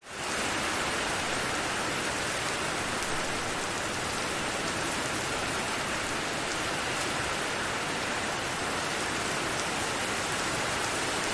nature rain med heavy on street and roof industrial estate light traffic pass occ